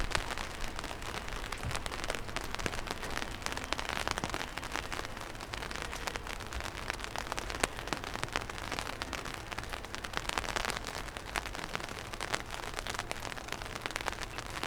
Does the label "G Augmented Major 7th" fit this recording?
No